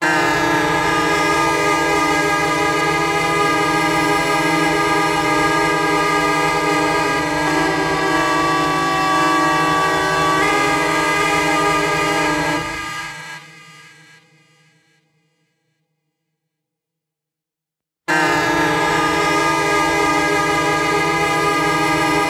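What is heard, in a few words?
Mijwiz Symphony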